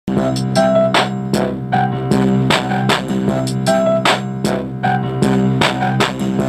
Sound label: Beat